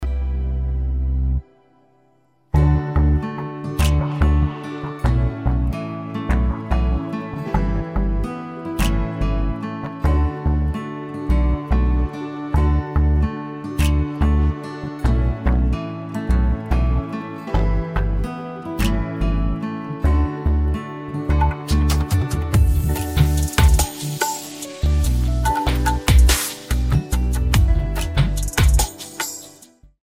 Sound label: Karaoke Song